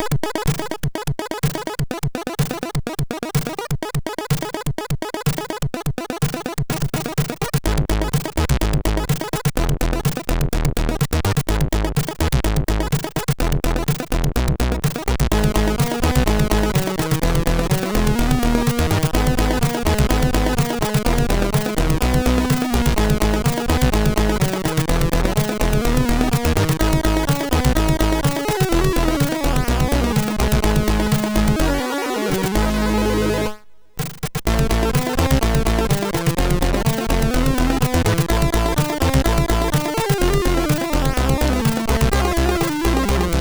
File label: No Limits tune, MOS6581R3; No Limits tune 12